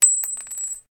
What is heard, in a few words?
brass, weapons